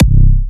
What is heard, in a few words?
Hitter C4, Kicks, Big Room Kicks